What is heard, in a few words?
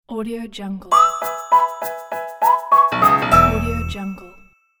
the kids logo